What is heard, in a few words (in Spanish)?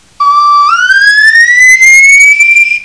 ave